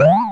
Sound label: bounce; N64; YOSHISISLAND